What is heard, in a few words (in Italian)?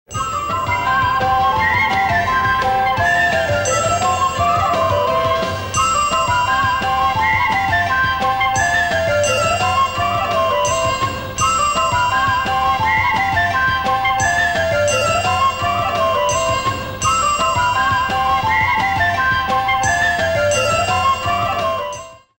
jingle; Jingle della ruota - Pranzo 1991/92